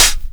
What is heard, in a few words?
HH mid op, big beat; Big Beat Samples & More; AKAI; HHMIDFX KIT